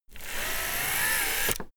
servo move powerful

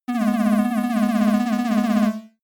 sci-fi data transfer comic

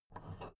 big plastic case handle take soft

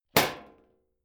club hit sheet clank soft

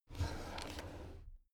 drawer cabinet crammed open soft halfway

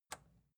record player power release on

